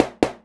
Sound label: BD, Drum Machines; 300 Drum Machines